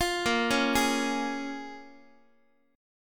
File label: A sharp Minor 7th, A sharp, down, Guitar; arpeggiated; x,x,8,8,5,7